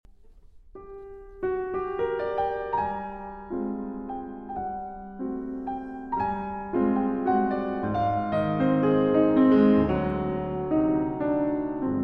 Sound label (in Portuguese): Violoncelo e Piano Valsa Brasileira; Suíte Brasileira